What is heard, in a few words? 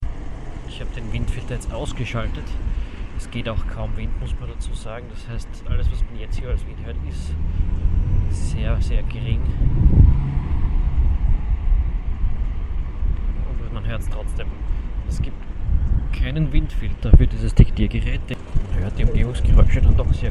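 test recording without wind filter, street; testaufnahme ohne windfilter straße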